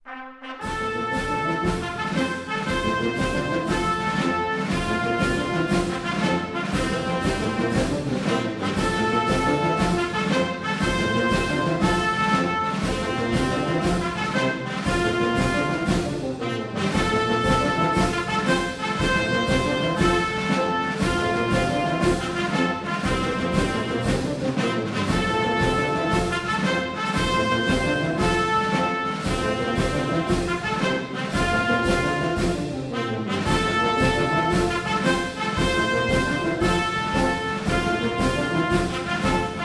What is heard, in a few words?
semper fidelis loop; marine corps birthdhay ball